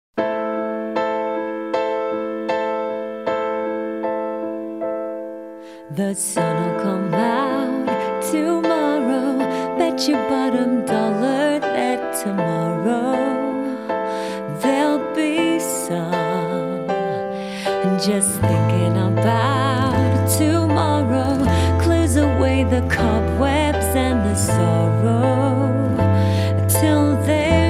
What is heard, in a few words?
Acoustic version